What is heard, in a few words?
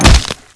big hit body, weapons, Pipe Wrench